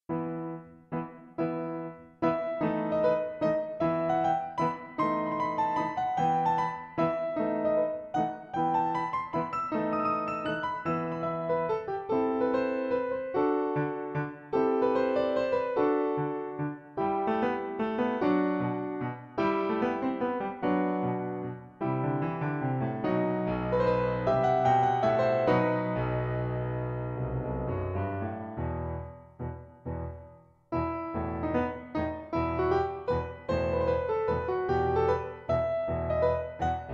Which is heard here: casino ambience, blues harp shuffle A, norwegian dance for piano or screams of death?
norwegian dance for piano